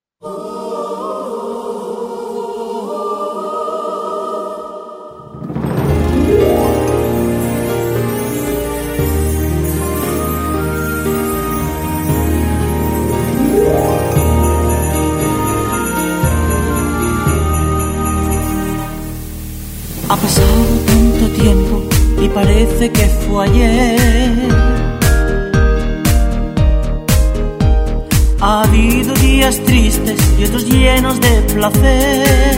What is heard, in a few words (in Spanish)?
canciones navidad